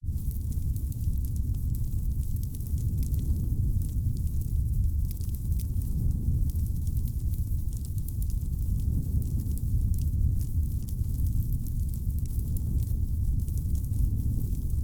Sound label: Fire burn Burning Swaying Fire Crackling Roar Of Flame